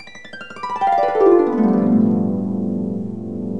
HARP GLISS

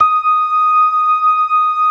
LOW MAN, LOWER MANUAL, Organ; InVision Interactive; Keith Emerson; The Most Dangerous Synth and Organ

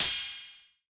Analog Cymbal; Yamaha DJX-2B

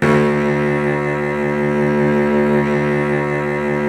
Virtuoso Strings, D1; ILIO; Kirk Hunter Virtuoso Strings VOL-2; 90 s Sample CDs

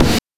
BD + CRASH; NES DPCM; MIDINES

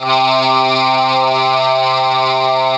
TB SYNTH, Talk Box

